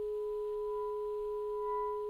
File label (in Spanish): Multiarmonicos